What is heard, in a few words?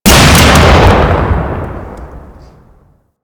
Rocket Launcher Fire